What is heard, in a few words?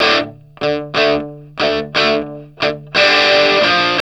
Vintage Blues Guitar, 60, D; USB Soundscan vol.22; AKAI